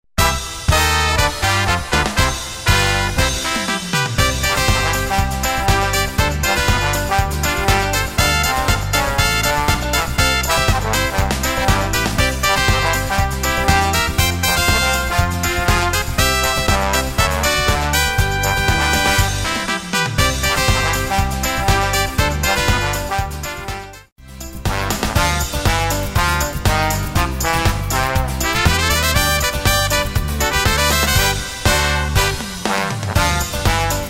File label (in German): Karneval Parade